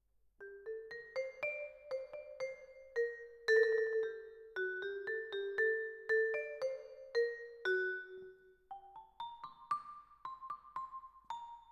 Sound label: Vibra, Twin F